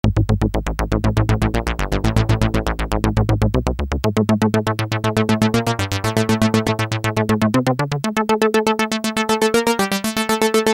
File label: synth